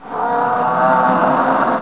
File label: screamer pain; monsters